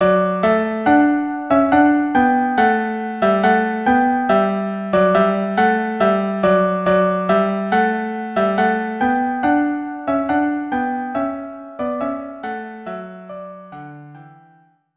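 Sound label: La Bergera violin cello, Sicilian folk song; Italian folk song; La Bergera (A l’umbréta del bùssun) (violin cello duo)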